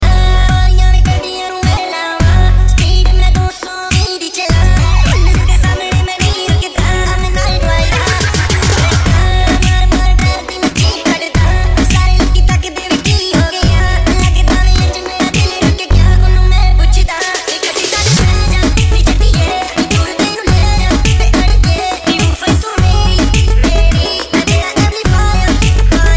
Amplifier Remix

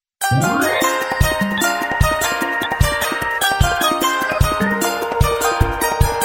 Instrumental

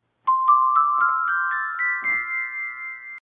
Fairy Tune Message

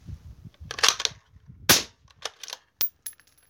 Suppressed 223

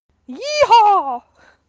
Yeehaw

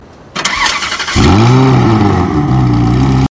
Ferrari 360 Start Up

